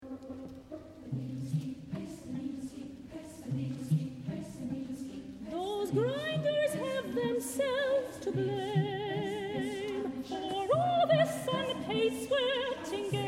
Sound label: southern marches